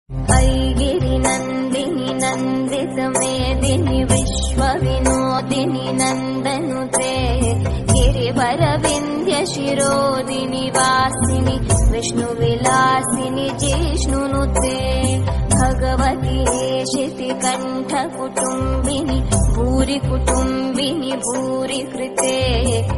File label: Sanskrit Ringtone